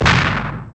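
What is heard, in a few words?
percussion blam, techno percussion; Roland L-CD701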